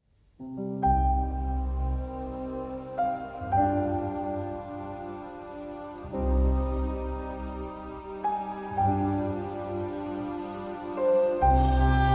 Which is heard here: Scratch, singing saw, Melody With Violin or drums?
Melody With Violin